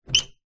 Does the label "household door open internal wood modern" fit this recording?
Yes